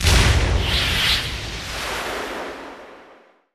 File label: Result Good; Deadstorm Pirates Special Edition; Namco System 357